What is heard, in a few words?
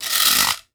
bamboo guiro; Voice Crystal - Steve Reid Definitive Percussion